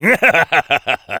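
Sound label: laugh evil, French; Engineer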